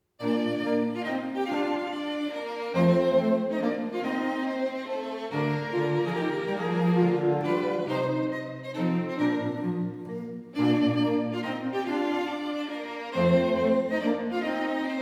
String Quartet No 1 in E Flat Major Canzonetta Allegretto; Op 12 II